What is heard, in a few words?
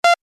Digital tone system beep